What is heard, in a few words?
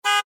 Car Horn